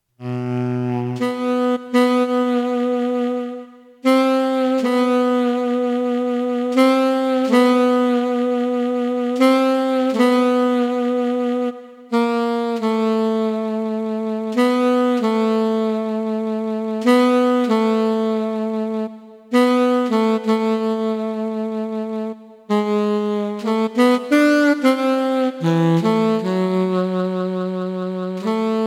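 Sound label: Prelude E minor Alt Sax; Chopin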